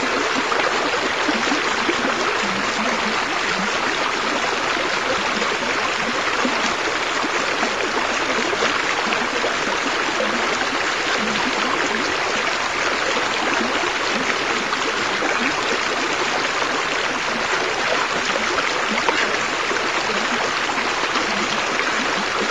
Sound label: dark alley river, ambience; svencoop; AoMDC